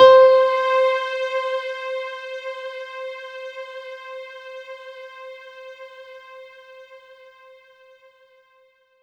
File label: C5, synth; 90 s Sample CDs; NiceBeats; SynthLine VOL-23